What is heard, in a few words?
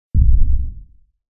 horror footstep massive distant heavy dinasour monster giant; zapsplat